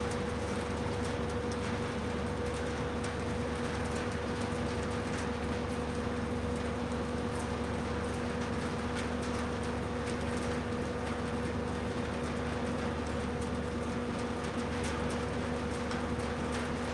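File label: industry exterior factory churn whine condensation drops; sonniss gdc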